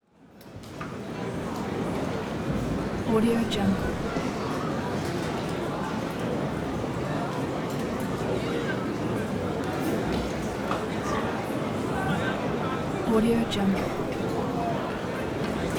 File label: concert hall moderate mumbling coughing